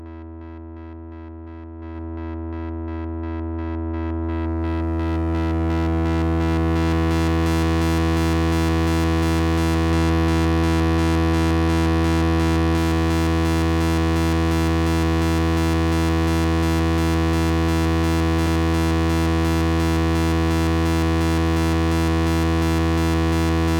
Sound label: Warm Audio TB12 Moog overdrive x731 steel vintage gain x18 x731 nickel steel; clean